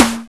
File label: snare